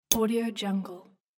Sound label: press